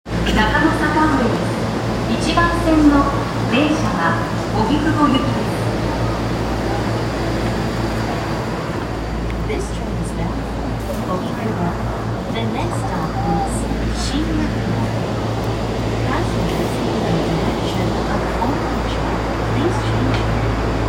丸ノ内線中野坂上西

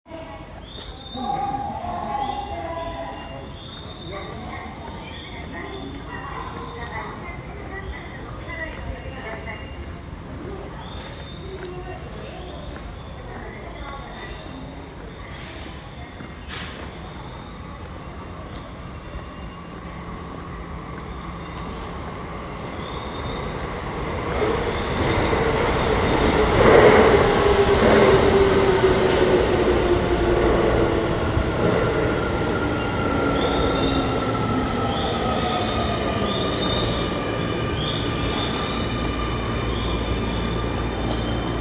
京王線仙川西接近

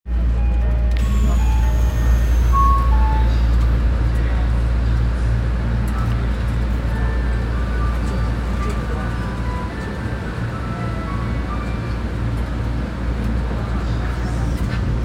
千代田線千駄木東

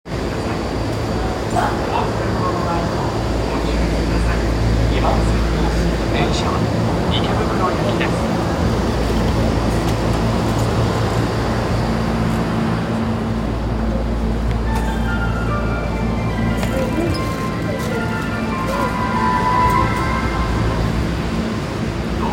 丸ノ内線新宿東

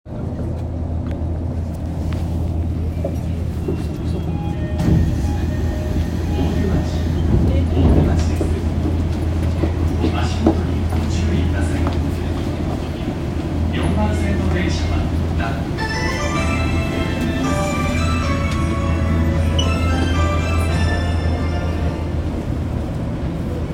東西線大手町西, train sounds

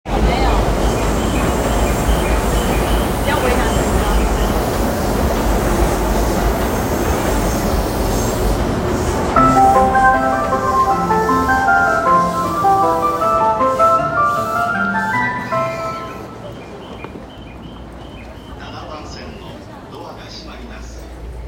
中央線快速新宿東, train sounds

